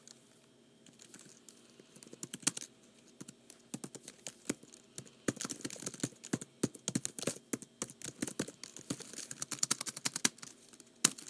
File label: Typing